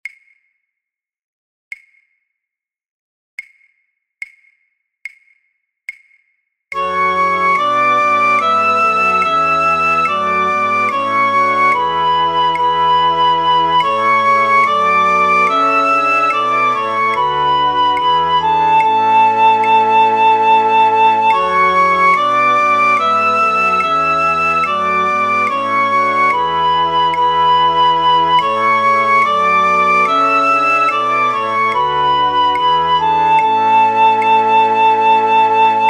Matthaus Passion fluitorkest Piccolo; voor oefentracks

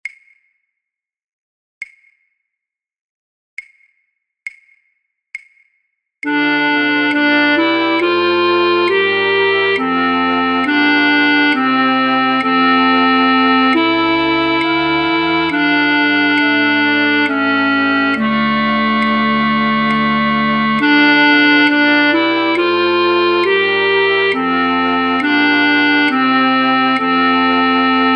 Matthaus Passion fluitorkest Altfluit; voor oefentracks

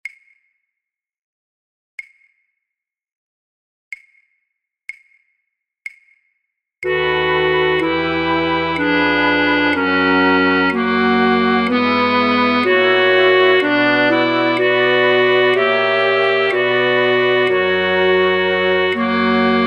Matthaus Passion fluitorkest Altfluit; voor oefentracks

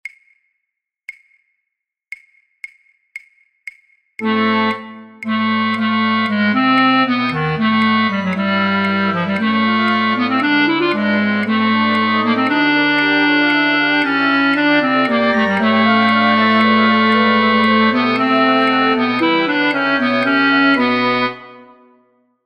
Basfluit 1B, fluitorkest; voor oefentracks; Matthaus Passion

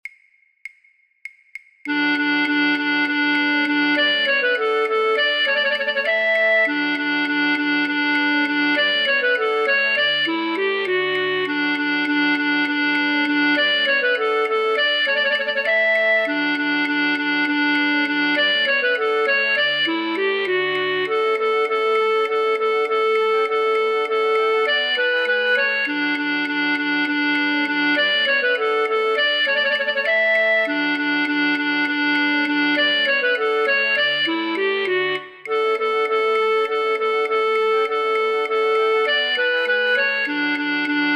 Contredanse flute choir alle partijen altfluit; voor-oefentracks; Rameau; Platee

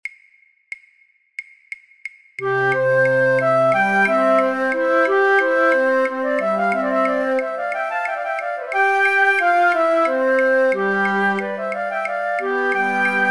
Marche pour la Danse flute choir alle partijen; voor-oefentracks; Rameau; Platee

